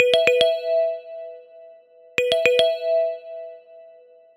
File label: timer sound; brave browser